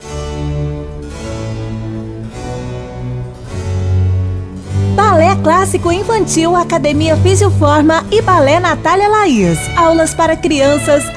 ballet classico infantil; studio gp

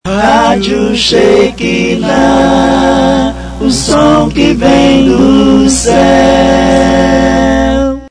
gospel vinheta cantada

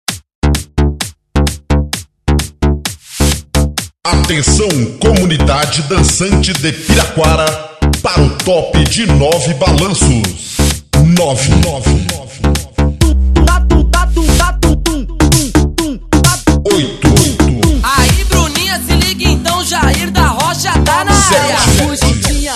aberttura cd eletro funk

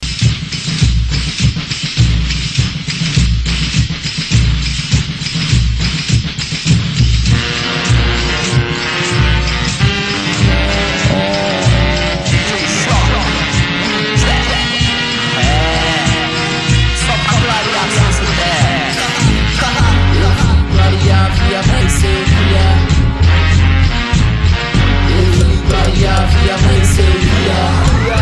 frevo

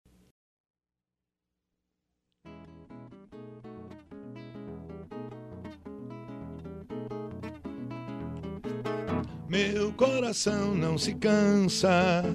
viola

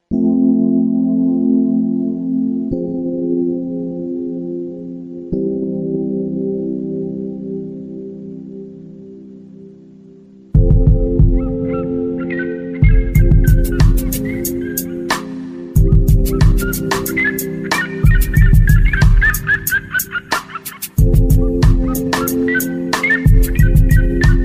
hino do ms versao rap

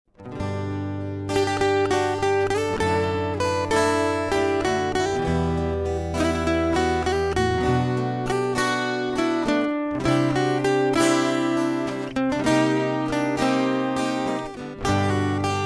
teste violões

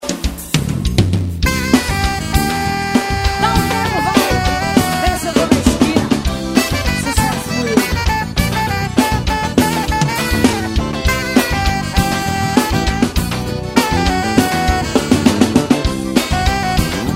forro

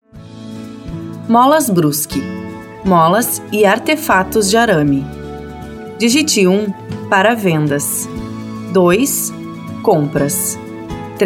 espera telefonica; molas brusque

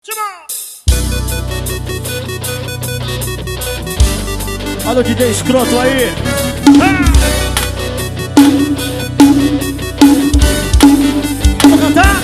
forró

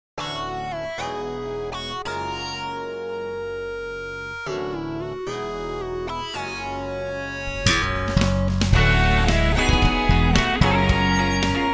instrumental teste

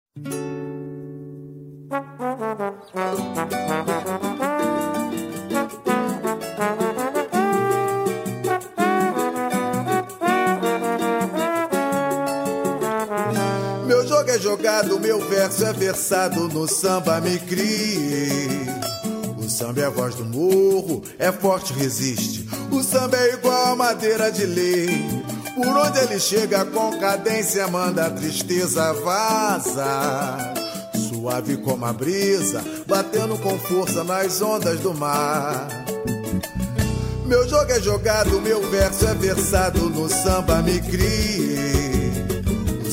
samba

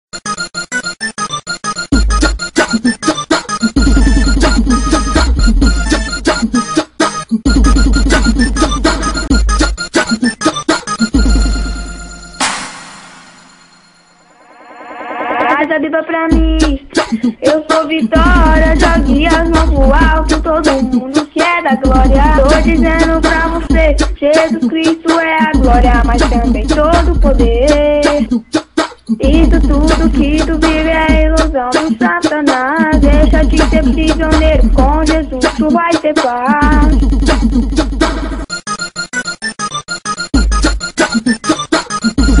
gospel funk

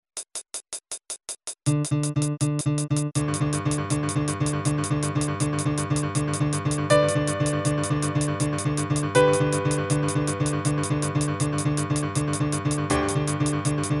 flauta doce dançando

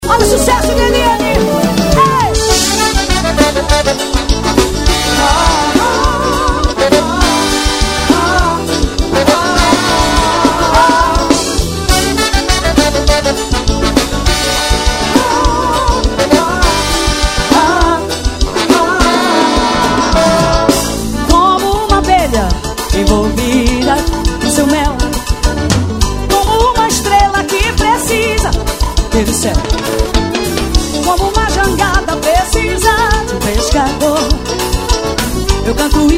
canto e declamo ao vivo

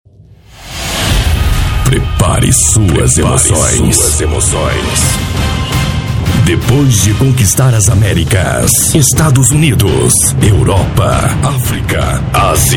festa forro

